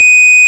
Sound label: ORG Farfisa